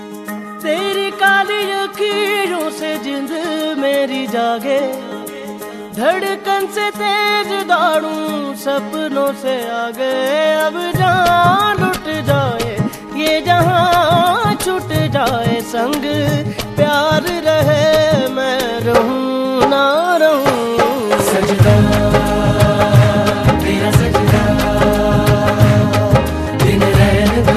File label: Hindi Ringtone